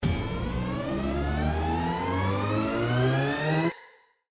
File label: SFX Anticipation, SLOTS; Wealth Gods